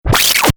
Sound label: Turntable Scratching Reverse; Dj Sound Effects; DJ (Sound Effects:Musical)